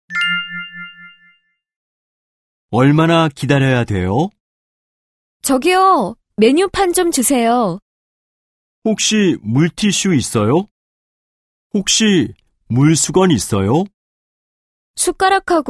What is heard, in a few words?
K-POP